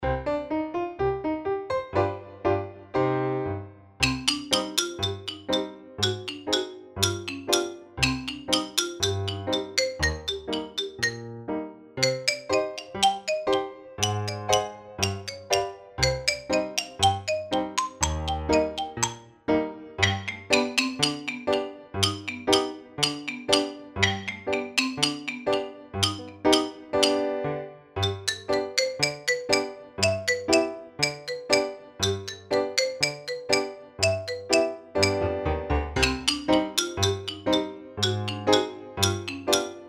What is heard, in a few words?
xylophone accom 120bpm; hall of the mountain king